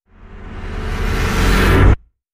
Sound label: crescendo